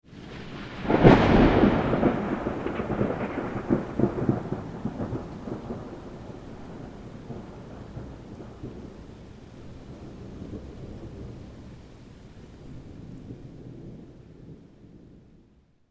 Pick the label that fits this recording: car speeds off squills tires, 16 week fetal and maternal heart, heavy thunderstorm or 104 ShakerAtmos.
heavy thunderstorm